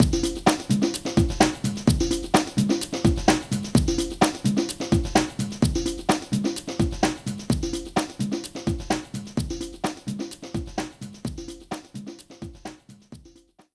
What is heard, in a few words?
Loading Loop